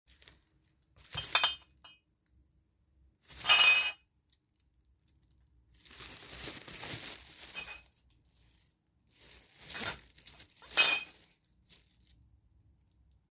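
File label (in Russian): bag put down and picked up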